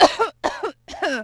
fall; Splashdown; XBOX; Brit

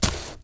blaster drop dirt; objects; Star Wars Obi-Wan; XBOX

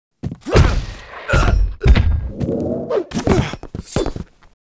tavern duel enemy falls; Sid Meiers Pirates; XBOX

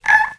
Squee Chirp; Myst III Exile

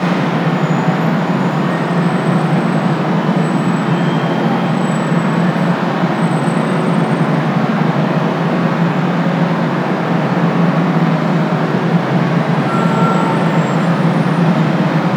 city heavy; ambient; Project Gotham Racing

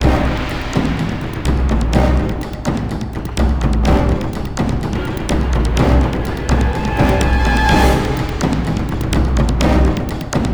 asian intense; Xiaolin Showdown; XBOX

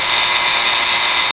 exit buzzer; Max Payne; hotel

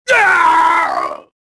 death

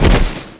body smack, skater; Tony Hawk's Project 8; XBOX

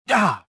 pain; jedi

